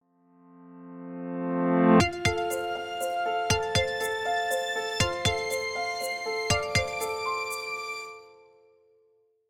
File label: Smartphone ringtone modern call